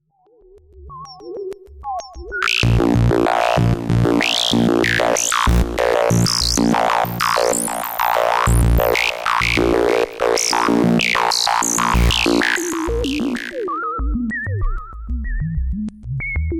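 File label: bandpass distortion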